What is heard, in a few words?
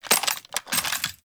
Reload; Thanotech; Trumna Prime